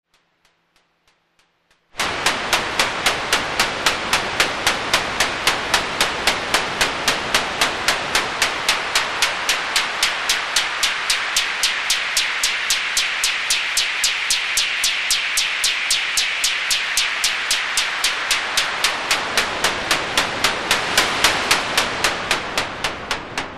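Noise Filter, Minisonic2 Analogue Sound Synthesizer; G.D.Shaw's Minisonic 2 synthesizer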